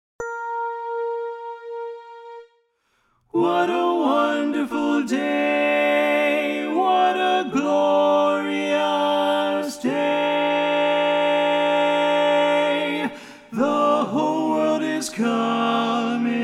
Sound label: - satb bari